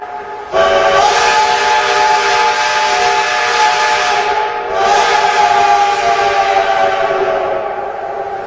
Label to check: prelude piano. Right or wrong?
Wrong